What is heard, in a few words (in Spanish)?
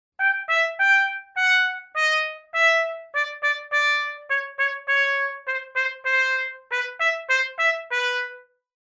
trompeta